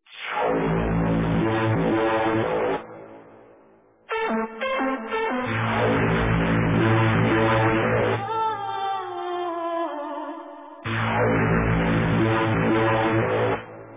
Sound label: Club sms